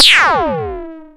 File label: SPACE DRUM; Rubber Chicken Software Sample Bank; DRUMS + PERC